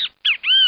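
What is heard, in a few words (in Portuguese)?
bem te vi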